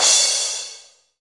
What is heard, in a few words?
DMX crash; Greytsounds; DMX KITS